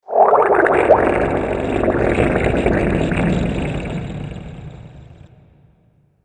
Quicksand Squelching; Squish Sound Effects; fantasy

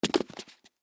Flip Flops Drop, Flip Flops Drop Carpet; Foley; Shoes; Objects; Blastwave

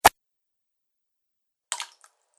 Golf Shot Water Plop; Golf Sound Effects; sports sound effects

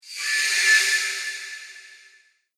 Evil Shrill Sound Graveyard, ghost; Graveyard Fx

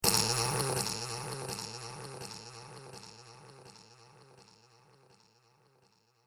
Stifled Laughter With Delay; Andrew Fleming

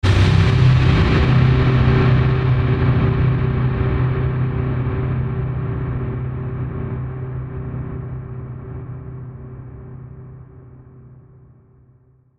Hit Blast Distortion Burst; Production Element Imaging Element Accent Transition; Blast Sound Effects

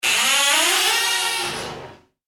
household door squeak creak loud; Creaking (Sound Effects:Objects); McKinney Sound

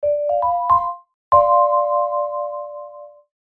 Logo Vibraphone Melody; Sonic Logo; Blastwave

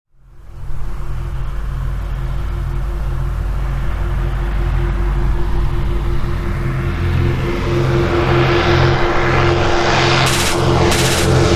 Propeller aircraft passing with machine gun fire.; Aircraft Strafe; Machine Guns

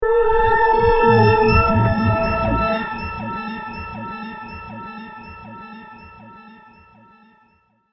Dark Magic Ident, scary tone; Scary Tone Sound Effects; fantasy; Andrew Fleming

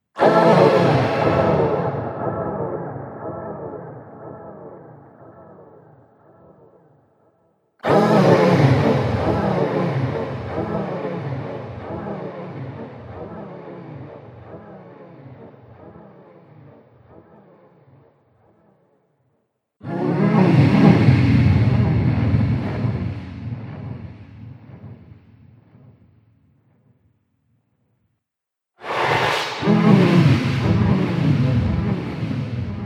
Alien Sex Sounds Collection; Alien Sound Effects

